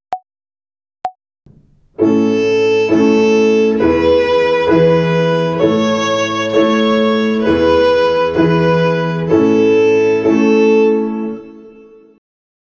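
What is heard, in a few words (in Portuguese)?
Ginástica até o dedo 2 - Corda Lá - Binária, Violino; Violino para os Pequeninos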